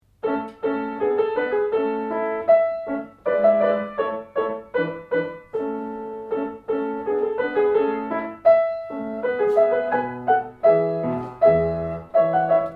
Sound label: Ensglish dance